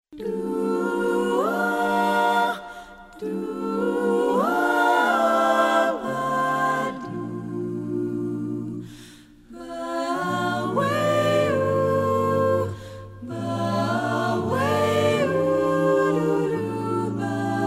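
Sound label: bird alone vocal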